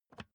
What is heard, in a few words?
office chair lever down